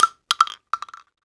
shell bounce, weapons, shells; ModernWarfare

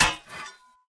2handed sword strike soft; Rust

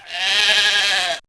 Sheep Bleat; Civ4 warlords; Resouces and Improvements

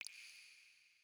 water drip light; Rust

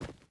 footstep boots forest run; Rust

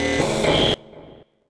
feedback

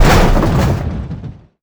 touch, damage; DCS

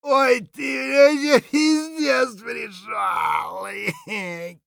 hurt near death; Escape from Tarkov; scav6

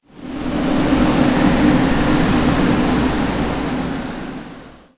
wind hil, ambient savanna; World of Tanks Blitz

